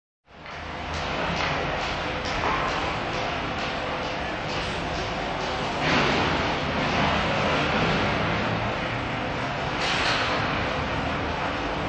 Train Medium Activity Booking Hall Underground Station; Hollywood Edge 3-D SFx